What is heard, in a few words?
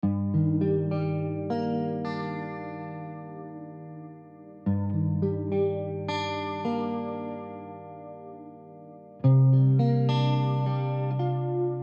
Gm 105 sad guitar impro